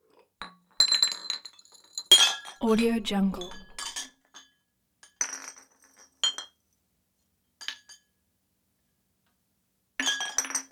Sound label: bottle can glass kitchen or bar clean up clank; prosoundfx